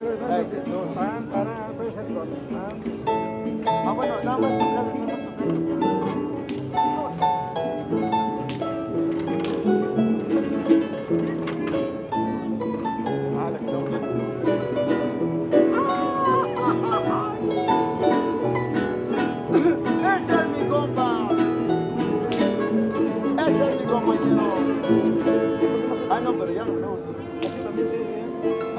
public places garibaldi square mexico city musicians tune harp warm up trumpet; felix blume